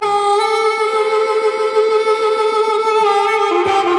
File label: Harmonica; Type:Rider; PS Vita; Groovymusic Clarendon Harmonica Lp